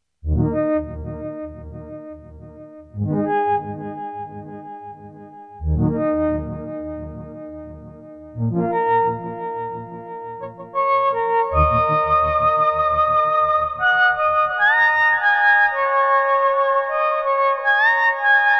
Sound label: Dynamic Flute; Chroma